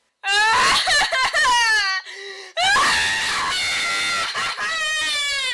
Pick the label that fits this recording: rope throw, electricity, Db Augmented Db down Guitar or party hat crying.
party hat crying